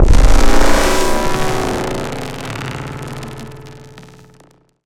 synth texture; bluezone; sonniss gdc

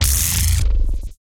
transition elastic; sonniss gdc

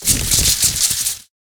chain lightning secondary strike; 5ft; psfx; 3rd level spells; call lightning

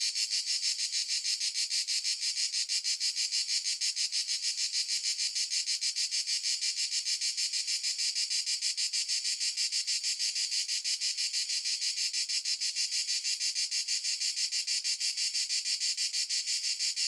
single cicada noon countryside italy; Sonniss GDC

